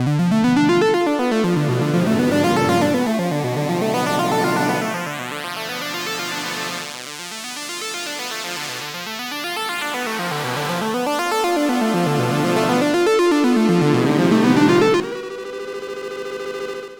Erica Synths Black Hole DSP2 Synth Chirp Delay delay time feedback CV